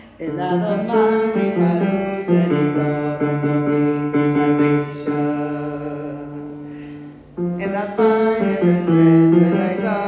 Baixos - compassos 44...48 (and I don´t mind); veu

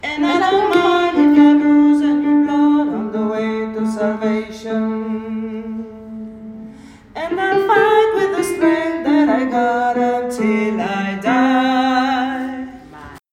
Contralts - compassos 44...48 (and I don´t mind); veu

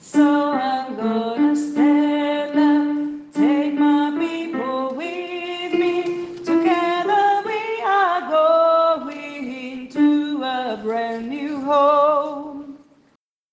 Sopranos - compassos 48...52 (so I´m gonna stand up); veu

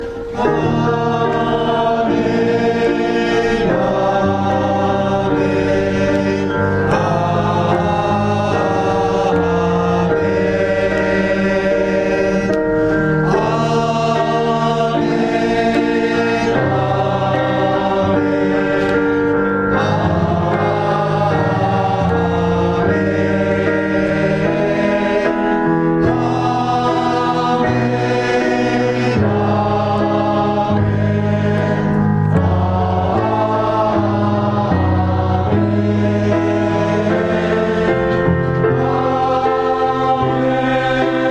amen tenors; Cor

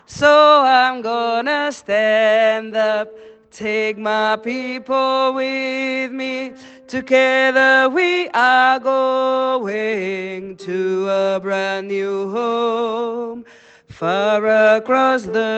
Contralts - compassos 48...56 (so I´m gonna stand up - far across the river); veu

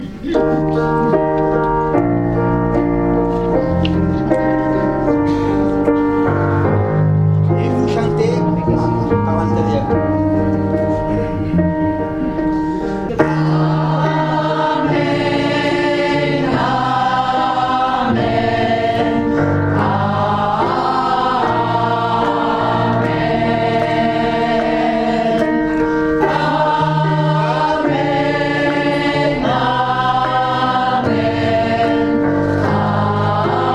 amen altos; Cor

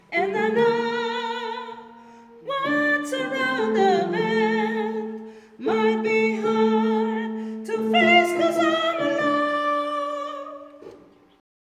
Sopranos - compassos 57...60 (and I know); veu

